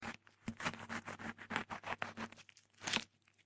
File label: sound effect cutting a lemon in 2 pieces with knife